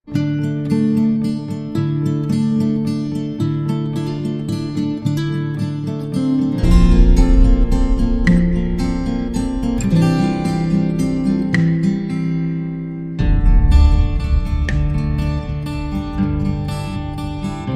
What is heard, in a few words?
Guitars Only